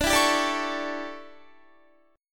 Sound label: D Major 7th Suspended 2nd Suspended 4th D down Guitar 4F 4A 5C 5G 5C 6E; DM7sus2sus4 strummed